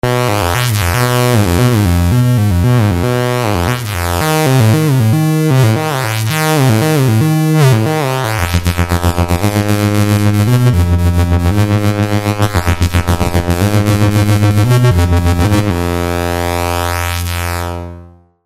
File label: Plan-B Model 25